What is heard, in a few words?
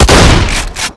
shotgun; garrysmod